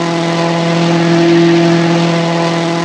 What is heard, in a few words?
rally, cars; speed-dreams; rs1-citrus-cs4; c4rally